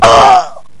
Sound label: AHHHHHHHHHHHHHHHHHHHHHHHHHHHHHHHHHHHHH